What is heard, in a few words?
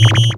SHORT, SHORTNOIS